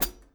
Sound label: Hat Pedal Softest; hydrogen drum samples